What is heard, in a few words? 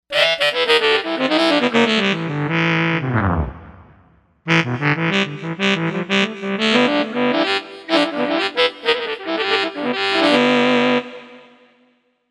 Hard Sax, Korg M1Rex; Factory Patches